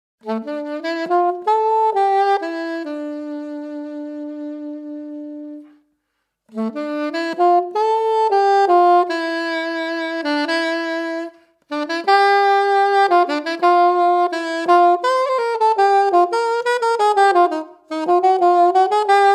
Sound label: solo